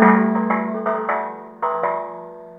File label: RYTHM G1; Big Fish Audio; Synth City